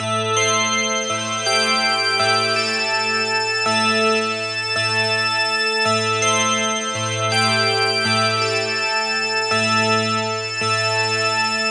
crystal melody

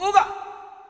oobah, Voice; single hits

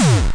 obnoxious kick

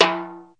hard timbale; Western and Latin Percussion; single hits

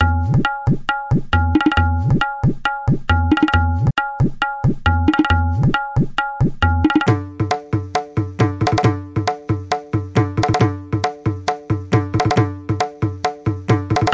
136 jugalbandhi tabla beat, drum loops and breaks

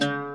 luth; GUITARS

